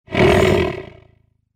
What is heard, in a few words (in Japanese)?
モンスターの鳴き声